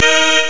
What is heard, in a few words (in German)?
chor; 8-bit Sampled Voice